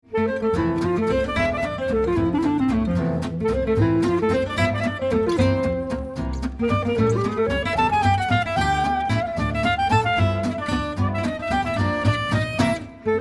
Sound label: Swing Valse